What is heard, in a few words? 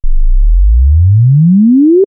20 400 sweep